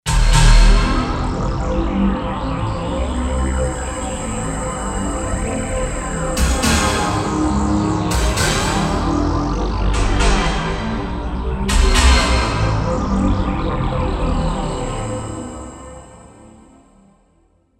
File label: IMPACT